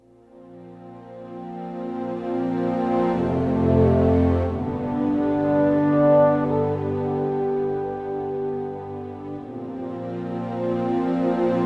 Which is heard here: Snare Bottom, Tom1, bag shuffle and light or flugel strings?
flugel strings